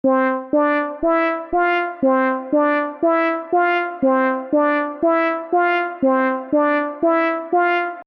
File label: Soft Horns Brass, midi